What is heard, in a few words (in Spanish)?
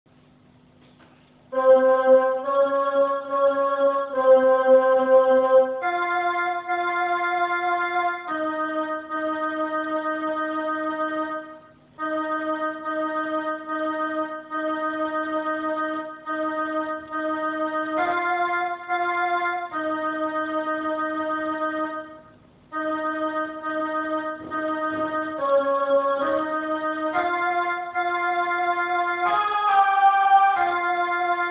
Veni Creator POLIFONICO CONTRAALTO; PENTECOSTES; Fiesta